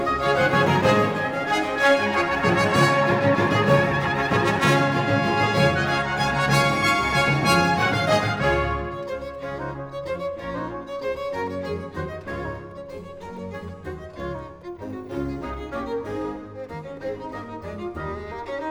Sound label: Easter Oratorio Sinfonia